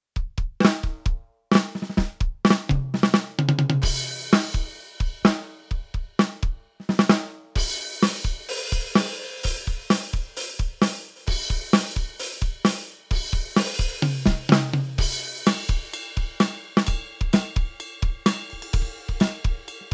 EFNOTE Mini 7 Metal Master Kit

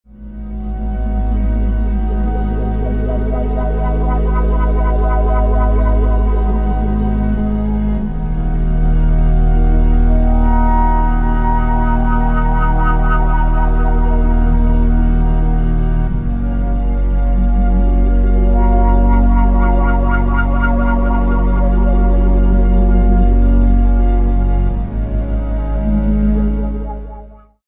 delta strings

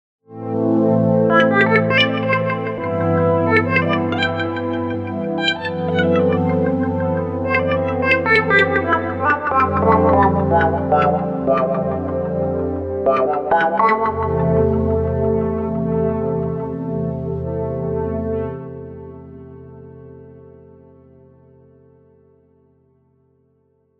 Behringer MS-5 complex LFO

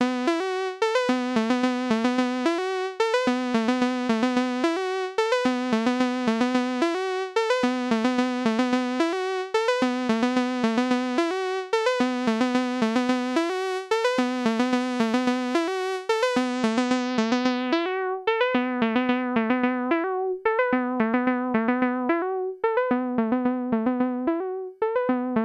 NTS-1 mkII Lead Filter

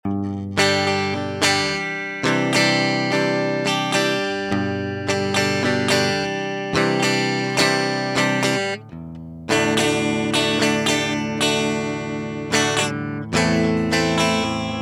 Drawmer 1977 Telecaster DI

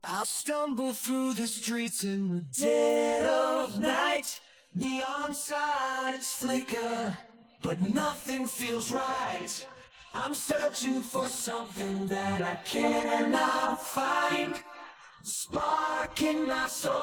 Vocals Harmony; RipX

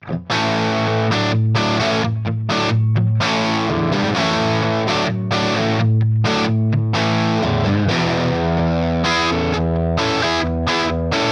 AC Box XV Crunch; Guitar Rig 7

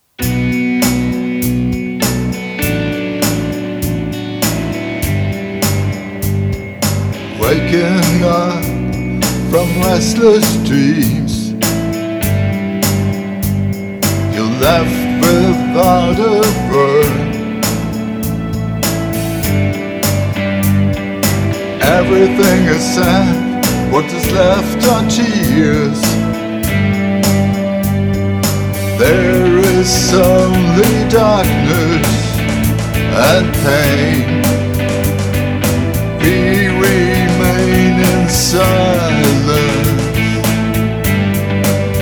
Postpunk; Behringer 646

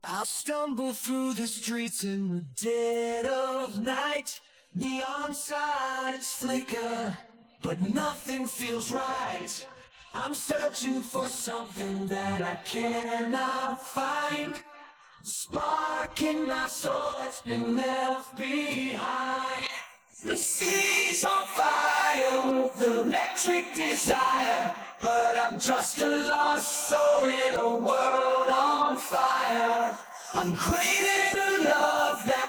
Vocals

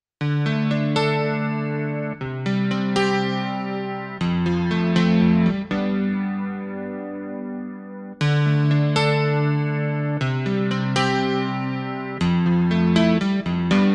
Arturia V Collection X CP70 Keyscape

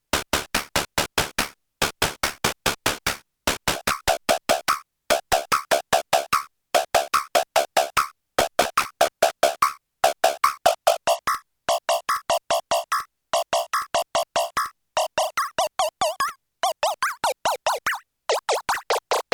FM Sounds Tweaking; Mega Synthesis

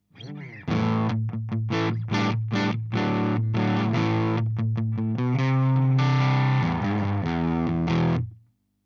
crunch Position 3 SM57

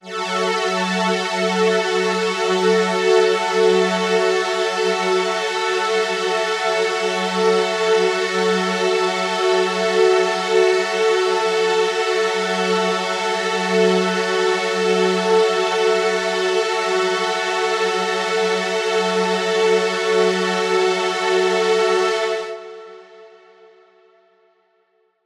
VSM IV VP 330 String Ensemble; GForce

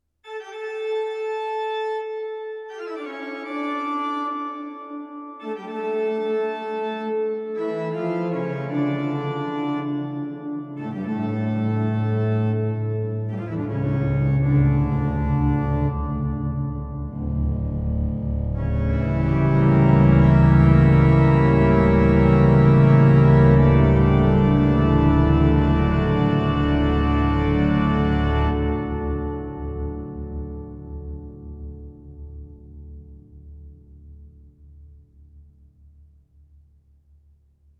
Sony DRE 2000 Organ

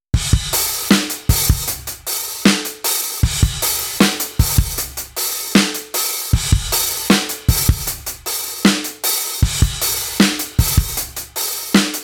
Transient Extra Sustain; HOFA IQ Series

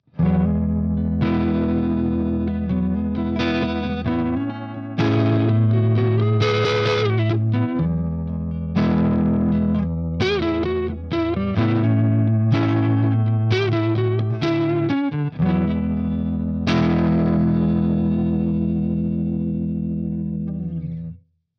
Guitar Rig 7 AC Box XV Vibes

